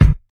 original kickdrum sound g sharp key, kickdrum sample tuned to the G# note